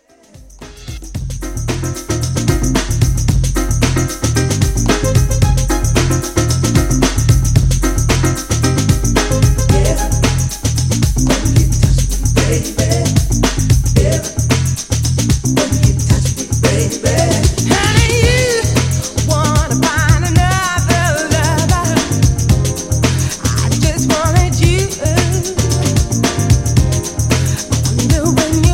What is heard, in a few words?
chimes heaven